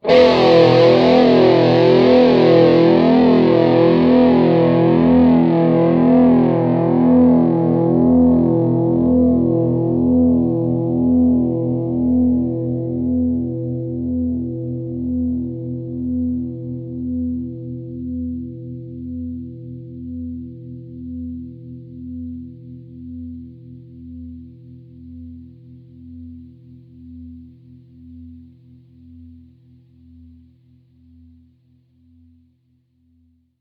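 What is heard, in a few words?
metal Long Power chord E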